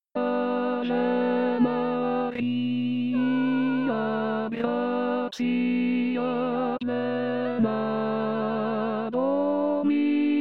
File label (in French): Ave Maria Voix Ténor; Javier Busto